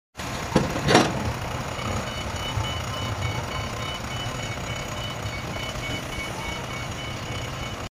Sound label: Forklift